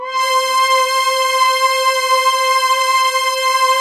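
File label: fatty sweep, JV PADS; Pro-Rec - Synthsonic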